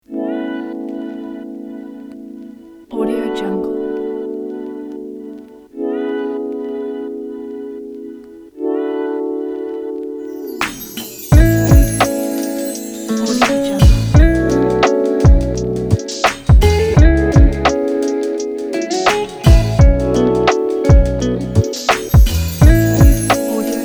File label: soft chill hop; musicalsmile